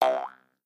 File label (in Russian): zvuk prujyny